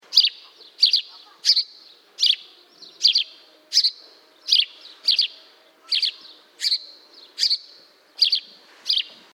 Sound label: Passer domesticus; SETE